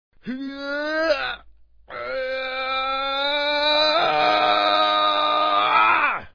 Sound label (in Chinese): 早起伸懒腰打哈欠声音